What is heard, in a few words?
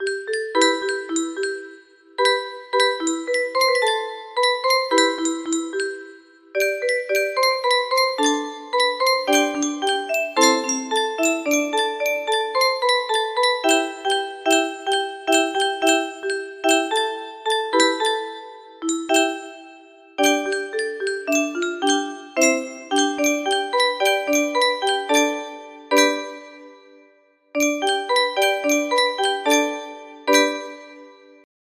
melody with high notes, music box melody